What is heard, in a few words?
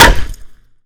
Plank Hit; zps; Weapons; Melee